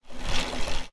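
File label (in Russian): быстрый короткий звук работающей швейной машинки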